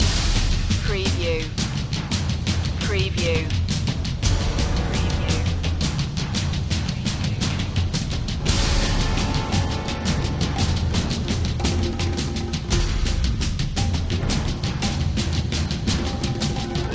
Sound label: percussion loop